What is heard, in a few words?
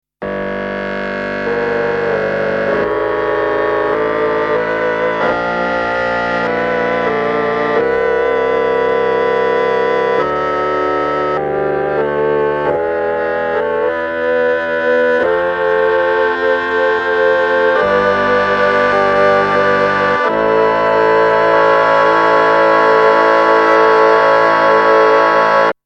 bassoon